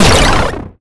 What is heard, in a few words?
death